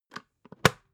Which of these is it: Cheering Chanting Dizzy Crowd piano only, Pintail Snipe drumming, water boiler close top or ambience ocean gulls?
water boiler close top